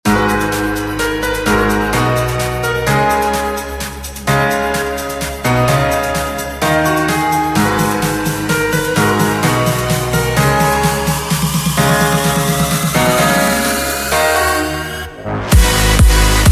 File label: Wall Of Sound; AUDIOTENT